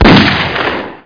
sniper; SpecOps2MPlayerDemo

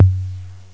Analog Tom, drums; TG300B

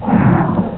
Earth Beast; Yipe! III; Monsters

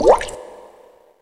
bubble jump; diver jimmy

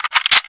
reload uzi; SpecOps2

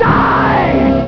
Die

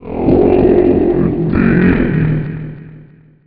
Loading; Action Dome

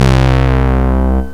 synth brass

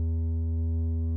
menu amb; Kingpin

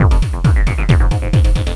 Bublz; Cloudz 1.0.3

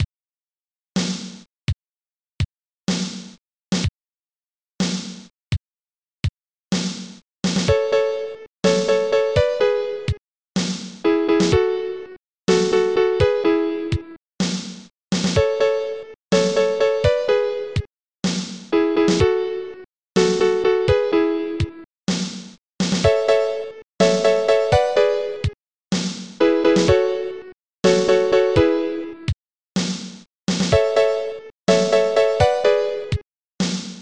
piano piece

